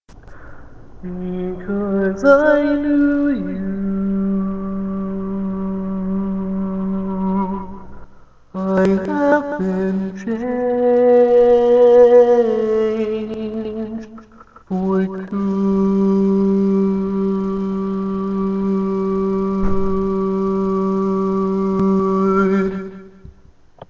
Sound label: Lead, barbershop tag